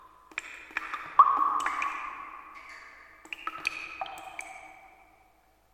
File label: Water Dripping Loop; Silent Hill Downpour